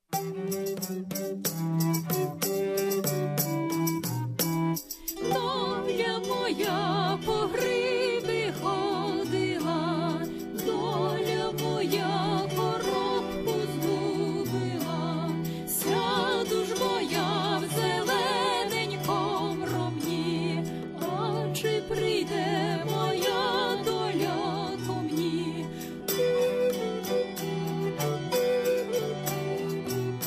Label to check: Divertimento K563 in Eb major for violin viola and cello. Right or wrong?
Wrong